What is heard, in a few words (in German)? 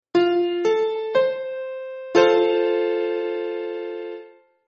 F dur, konzertina; kunstklang